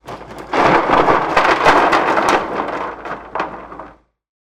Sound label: impact wood pallet wooden decking drop smash